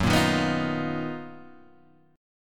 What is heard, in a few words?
B Major Sharp 11th Gb